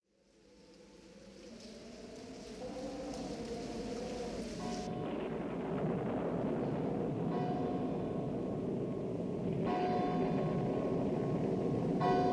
Midnight In Graveyard With Clock; Halloween; Halloween Sound Effects - Sounds Of Horror

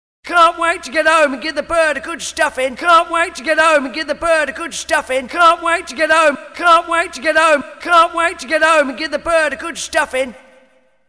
Christmas Jingles

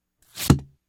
Axe Slices Flesh Hits Wood, Sounds Of Horror; Halloween; Halloween Sound Effects - Sounds Of Horror

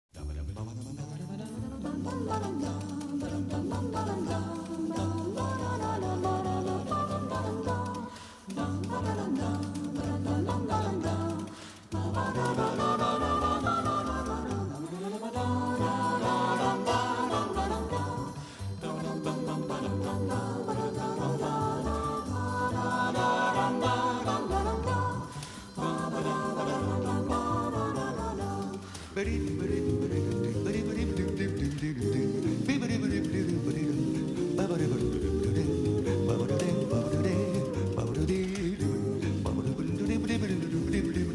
Medley Jingle Bells Il Est

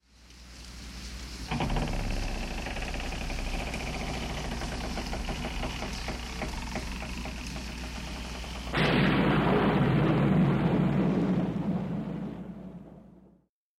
Creaking Door Closes; Halloween; Halloween Sound Effects - Sounds Of Horror

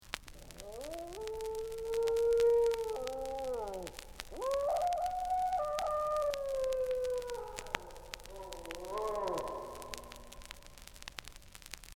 Howling Wolf; Halloween; Ghostly Sounds; Power Records

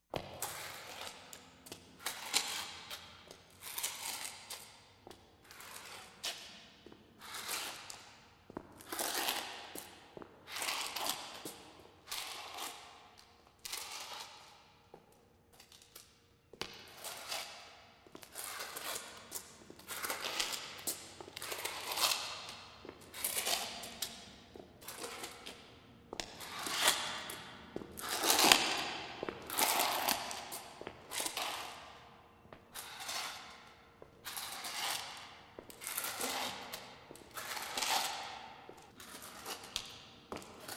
Slow Walk With Limp In Echo; Halloween; Halloween Sound Effects - Sounds Of Horror

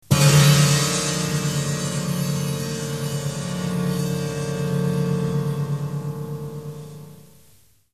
Music Cue; Halloween; Hallmark Halloween 1989

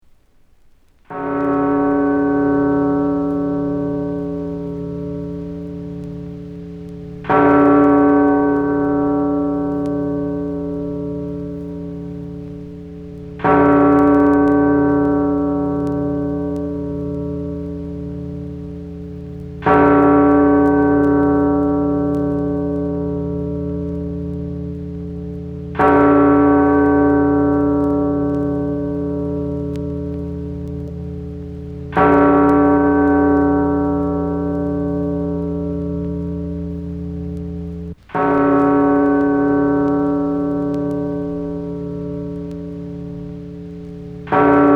Clock At Midnight; Halloween; Haunted House

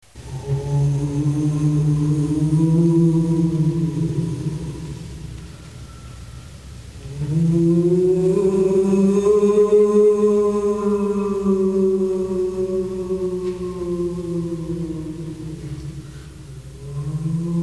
Ghostly Moans; Halloween; Hallmark Halloween 1989

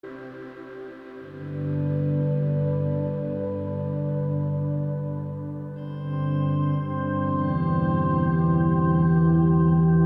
Reeds

